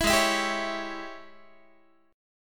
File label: G sharp Major 7th Suspended 4th Sharp 5th, Guitar; G#M7sus4#5 Chord